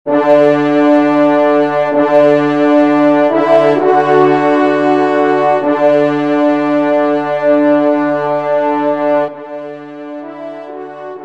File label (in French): Offertoire TRP; Devert; Offertoire 1e Messe